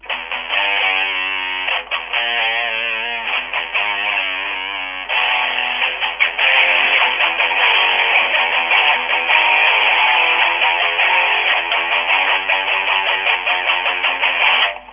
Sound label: metal; Alcatel OT-535 PC Suite